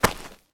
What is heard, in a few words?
footstep; skyscape